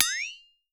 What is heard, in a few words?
FLEX-A-TO, FLEX-A-TONE; Voice Crystal - Steve Reid Definitive Percussion